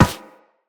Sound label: Run Metal, Footsteps; Characters; Operator